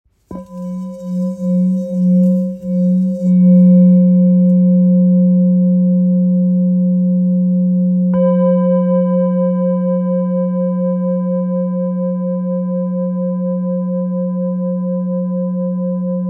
Bol ancien fa diese

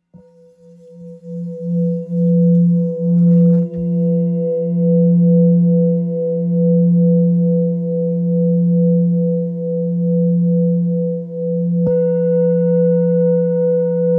Bol bengale mi

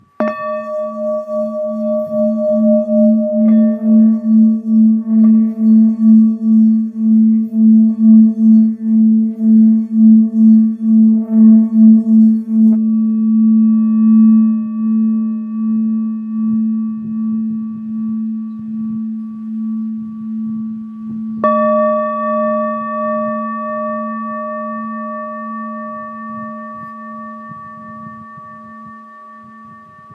Bol Ancien Note La 650g

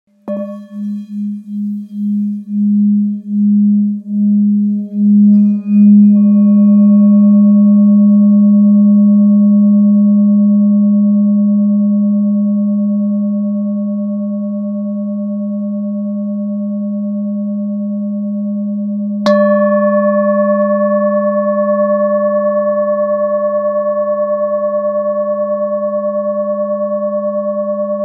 Bol thick sol diese

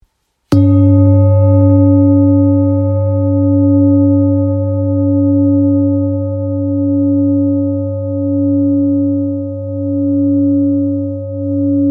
Bol chantant therapeute sol

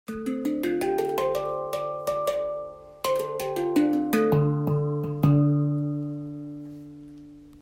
9 notes ré mineur pentatonic noir

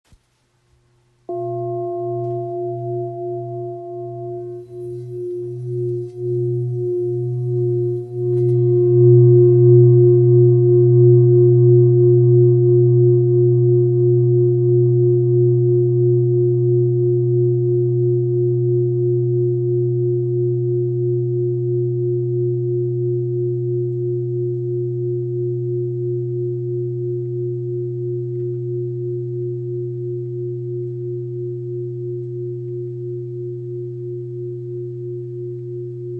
Bol therapeute do

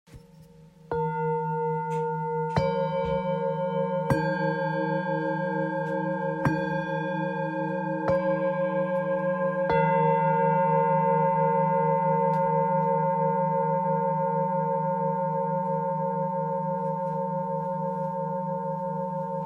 Set 3 bols bengale; fullmoon equinoxe

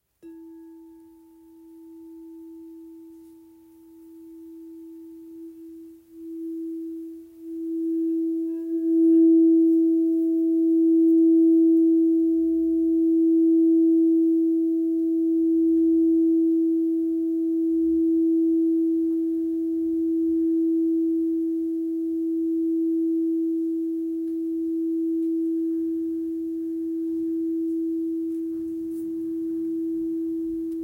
Bol cristal rainbow 7 pouces mi

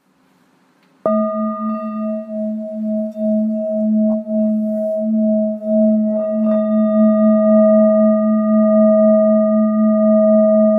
Bol bengale 900g-1kg Redieze; Gros bâton cuir bol chantant

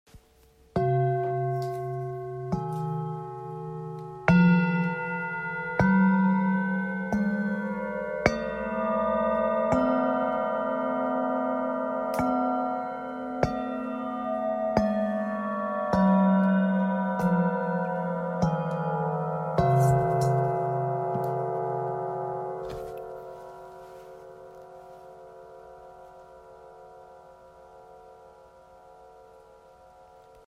Set 7 bols kobera bengale

